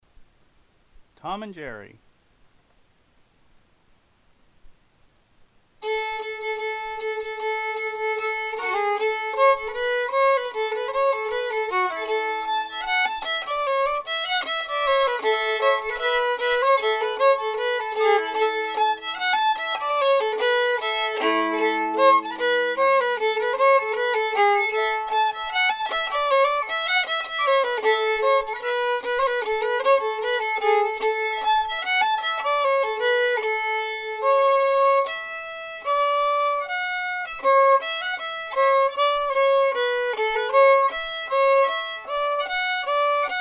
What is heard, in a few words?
fiddle; Festival Tunes for Clawhammer Banjo Fiddle Versions